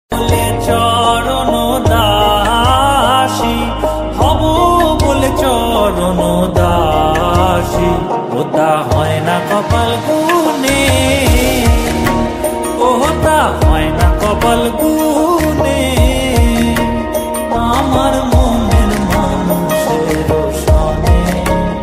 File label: Bengali Folk Song Ringtone